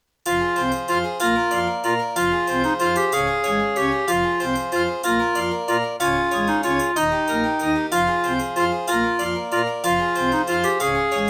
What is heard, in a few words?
Track 6, Roland CM500 GS MODE; Death Gate